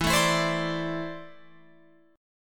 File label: E 7th Suspended 4th Sharp 5th, Guitar, Open F; E7sus4#5; strummed